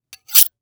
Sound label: Weapon Knife Sharpen On Metal; AdobeCC